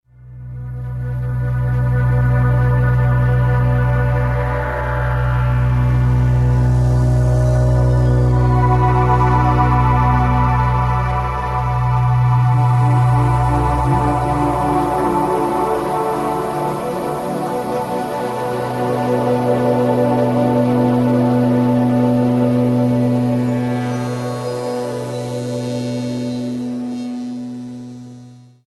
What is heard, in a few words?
Game Over; vortex attack ex windows gamerip 2019